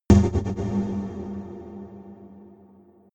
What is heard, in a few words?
energized fuse; whooshes-rises; hits-impacts